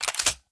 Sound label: Reload; blue archive